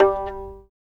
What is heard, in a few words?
fiddle pizz G3 R; Sampleheads; Whole Lotta Country 1 & 2